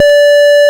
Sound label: Square D4; Ableton Foundation FreePacks; Foundation Glitch Toys & LoFi